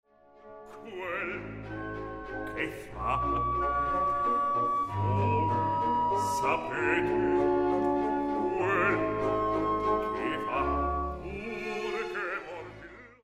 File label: Flute Trill